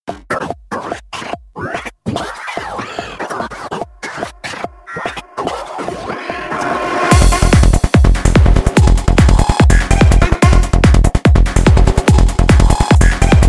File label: Frog Machine